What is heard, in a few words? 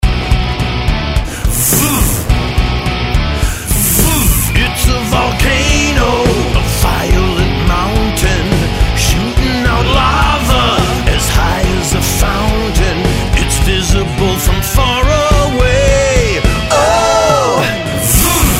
V Song Volcano; kindergarten grade 1 2 letter sound